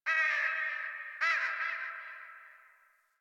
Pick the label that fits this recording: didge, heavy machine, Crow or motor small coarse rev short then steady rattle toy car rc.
Crow